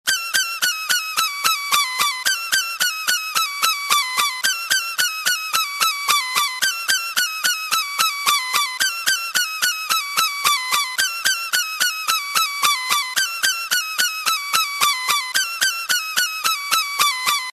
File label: annoying